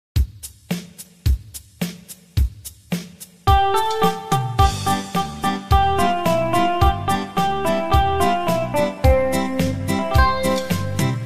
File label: beat